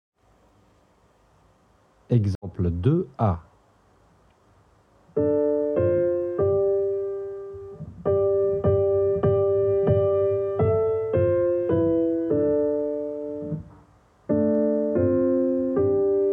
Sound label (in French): harmonie